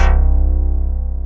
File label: Dynamic Bass; Best Service Gigapack 2